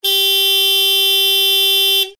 rich tone horn high; hella

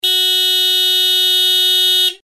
strong tone horn set low; hella

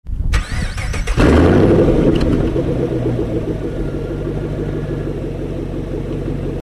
g8gt exterior start idle, exhaust systems; corsa

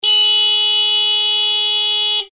signalhorn s70 high; hella

